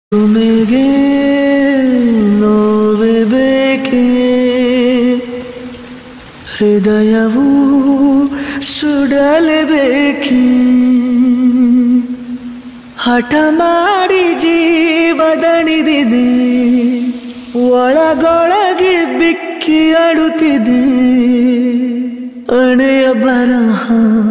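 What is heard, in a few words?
kannada sad song; mungaru male 2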